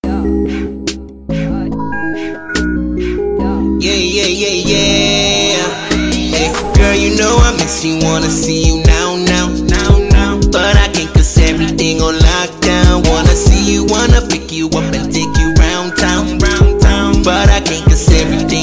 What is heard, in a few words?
Freestyle